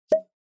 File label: Pop Dry; Onoma